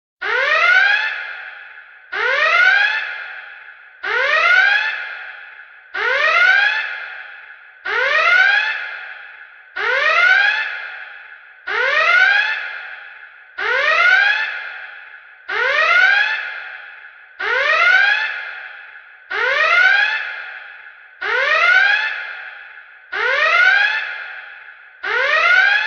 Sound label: red alert engineering; TOS